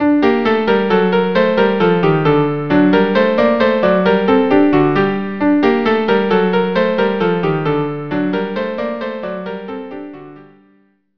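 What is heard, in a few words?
violin viola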